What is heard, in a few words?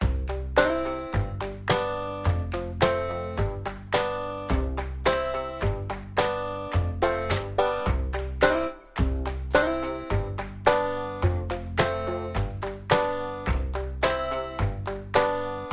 music blugrass; zapsplat